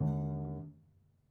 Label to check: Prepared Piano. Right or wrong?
Right